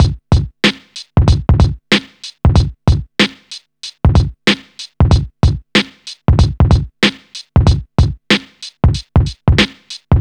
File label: 94 bpm drum beat c sharp key